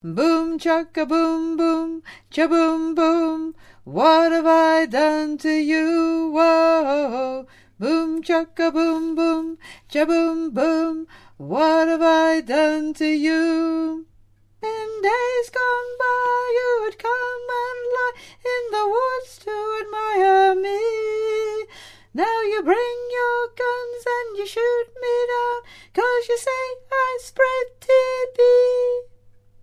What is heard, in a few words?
Ch Hi V